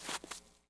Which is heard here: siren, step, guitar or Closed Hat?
step